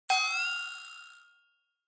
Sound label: Single Accent